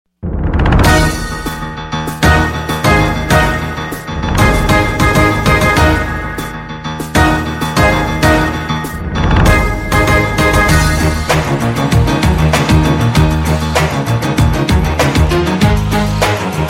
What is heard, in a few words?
Instrumental